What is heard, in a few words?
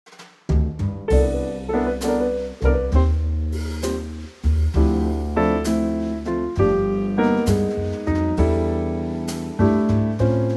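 C Trio, Backing Tracks for Male Vocals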